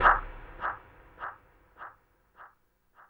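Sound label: CRUNCH left; Spectrasonics; Liquid Grooves 1 & 2; AF.PLANET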